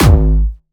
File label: A1, Jumpstyle Kick; vengeance essential club sounds-3; VEC3 Bassdrums